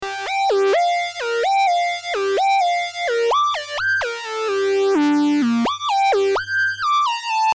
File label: DUBSTEP SYNTHE